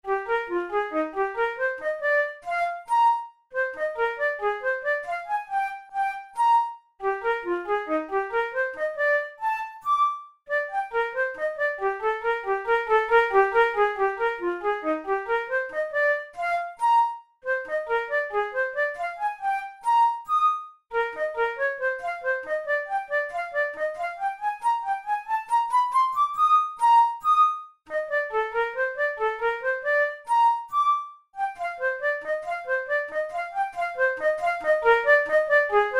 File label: pizzicati, flute; delibes sylvia